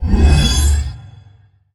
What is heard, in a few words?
Pickup Magic; SFX Kit; Pickups